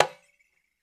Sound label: IYA CHA C 1N, World Percussion